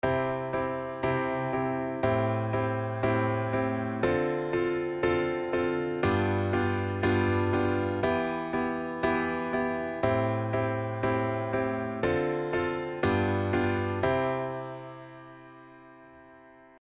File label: avoid chord